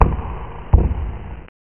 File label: Amiga Move Red Dragon Sound; Dungeon Master and Chaos Strikes Back